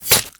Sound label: knife stab; tfa starwars melee weapons pack